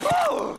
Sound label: Gasp